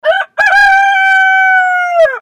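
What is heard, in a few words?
rooster in the morning, animal sounds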